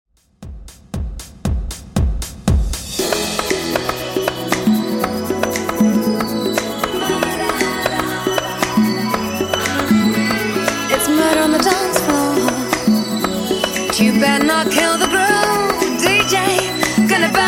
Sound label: Deep House 117Bpm